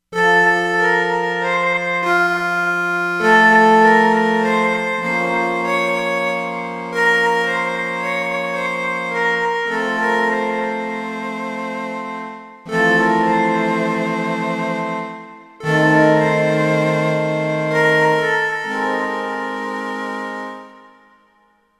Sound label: ice vox, Korg; Korg MSC-13S Ethnic 2